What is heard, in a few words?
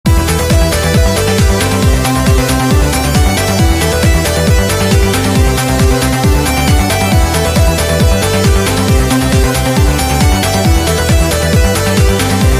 Digital Blues